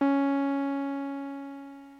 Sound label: bbm, Omnichord; OmnichordGodot